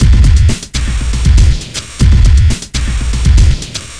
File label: arty 120bpm, downbeat dance grooves